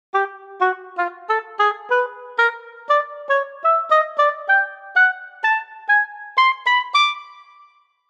OBOE staccato

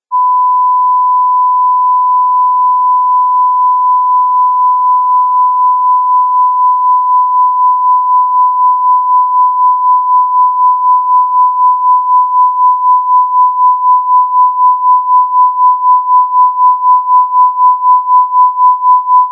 tremolo 1000

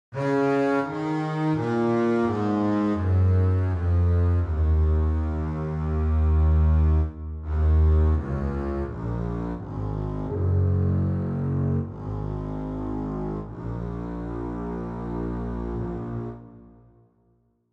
legato

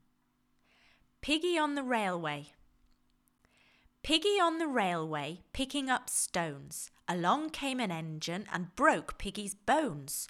Piggy On The Railway

